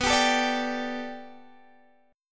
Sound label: A 7th Suspended 2nd Suspended 4th